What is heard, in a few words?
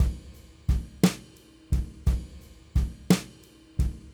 Brushes SN RC